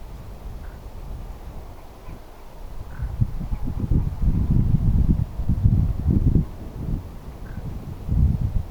ilm kyhmyjoutsenen tuollaisia hiljaisia aania

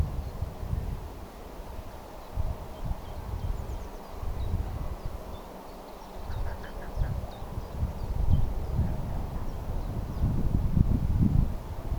vahan mahd vuoritiltalttinuoren laulua siis mahdollista jokin tiltalttilaji kuitenkin

